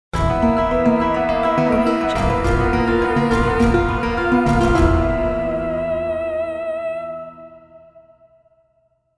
evil apocalyptic stinger

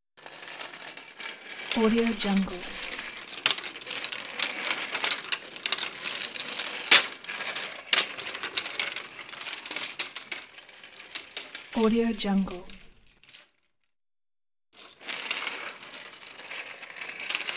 stretcher rolling down hallway

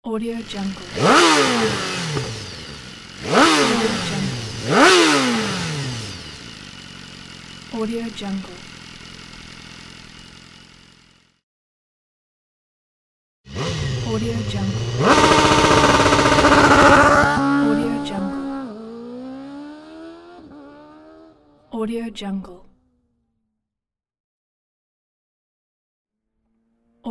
motorcycle revving and ride

